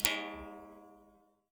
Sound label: Classical Guitar Extended Technique